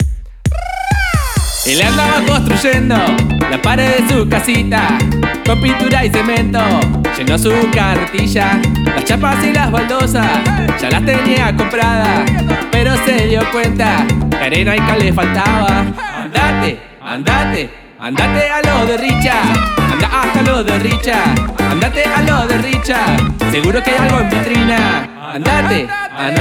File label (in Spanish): jingle; Richa La Bombachita